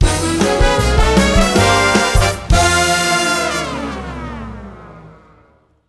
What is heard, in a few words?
Negative feedback; Getinlinegirls